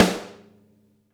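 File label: snare, Interactive Drum Kits; Sonic Reality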